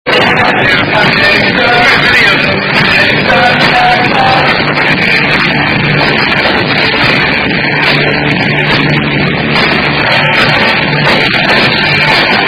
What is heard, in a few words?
Singing Father Abraham